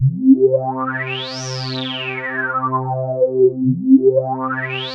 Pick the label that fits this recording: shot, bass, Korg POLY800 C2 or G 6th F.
Korg POLY800 C2